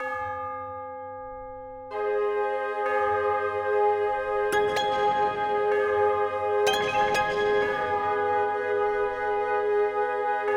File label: Remix; Mt. Thunder + Magma Cavern; PMD